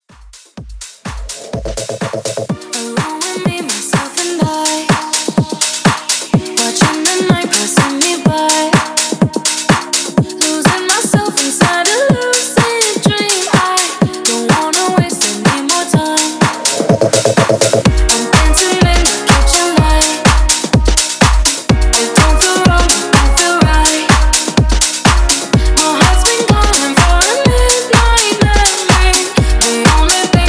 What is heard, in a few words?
Pop House Dance 125 BPM